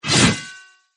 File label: attack; Power Rangers vs Teenage Mutant Ninja Turtles - Ultimate Hero Clash 2; galvanax